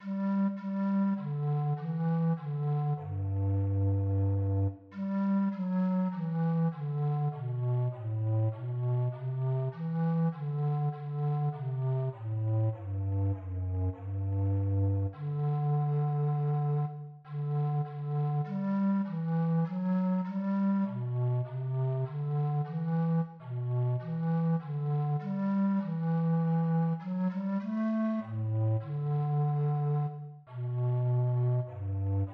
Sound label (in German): Gelobt sei deine treu Bass